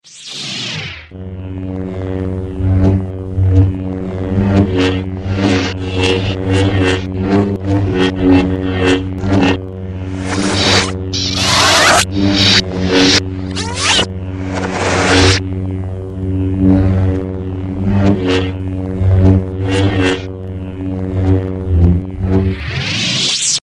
lightsaber, reverse

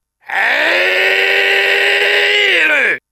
wryyy; reverse

